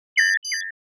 HUD futuristic button deny

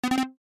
Bar chart pop in animation infographics

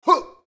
Boxing fight vocalization male grunt

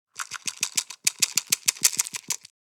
Pouring pills into hand plastic pill container; medicine

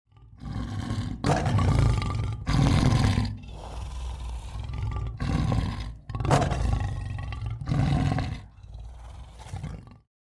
Wild lion growl

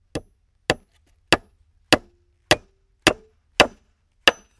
Hammer hitting small wood window hammering

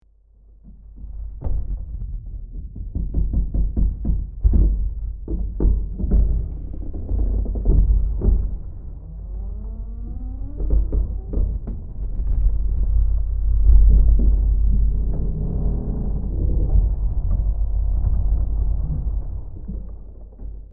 Ship rumbling ambience, boat

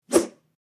Arrow fly by whoosh

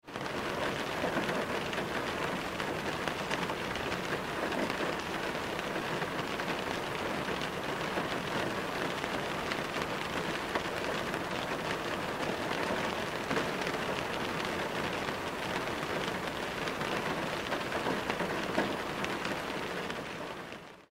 Rainfall car interior perspective

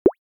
Bubble click

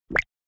Water bubble pop

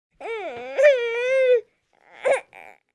Infant crying feeling grumpy and tired

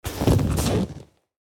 Sitting down on a sofa; household

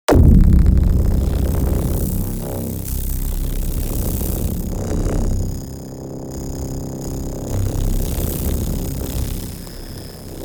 Unstable energy source energy field

